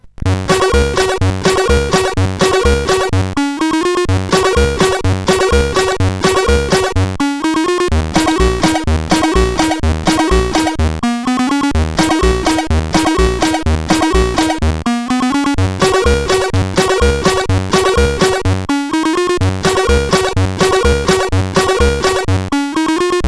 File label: Fast Food, CSG8580R5